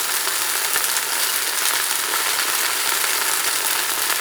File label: poison projectile loop